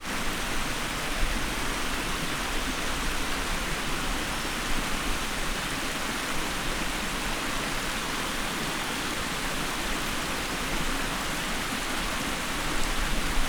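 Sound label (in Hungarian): kőtekenő közelében, balra egy autó, szél, patak; visegrad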